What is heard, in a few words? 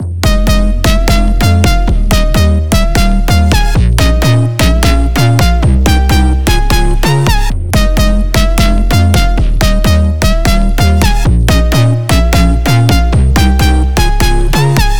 128 BPM F Min, Drop Loops, House; Sydney; Cymatics